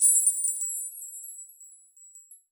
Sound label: mixed bells, bowls; World Instruments 2; WORLD PERCUSSION